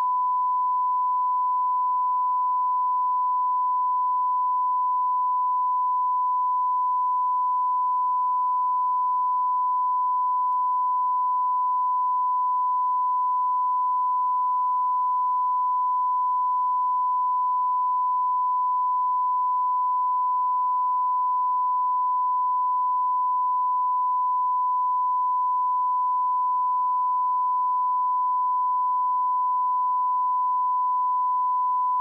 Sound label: 1000Hz; 20dBFS